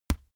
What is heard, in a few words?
boxing gloves batter soft; sport freizeit; boxen